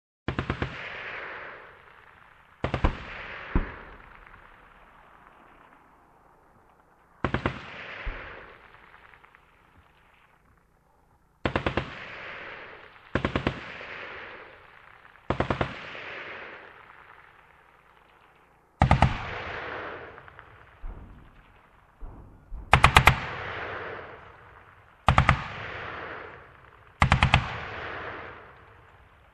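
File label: zvuki pulemeta, machine gun sounds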